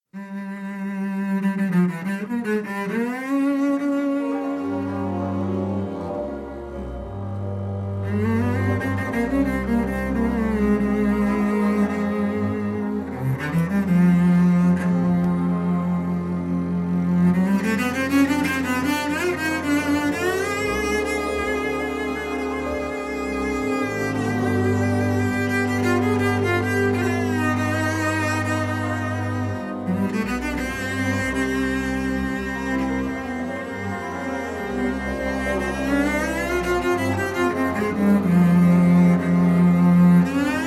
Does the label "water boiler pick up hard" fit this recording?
No